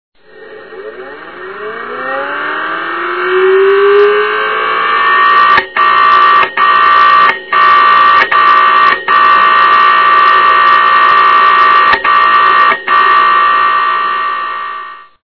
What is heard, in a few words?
scraping or bad motor